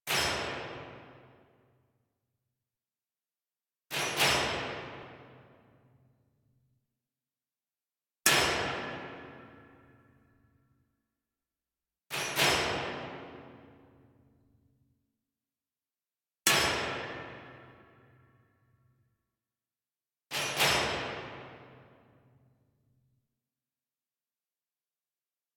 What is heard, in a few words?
Hvy Blades Metal Perc; Warframe; Dynamic Music; Grineer; Grineer One